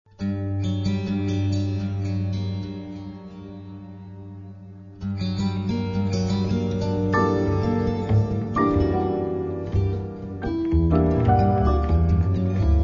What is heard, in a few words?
jazz